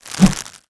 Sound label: swing; Trapeze Carry